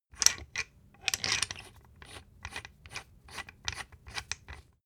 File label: thread screw insert and screw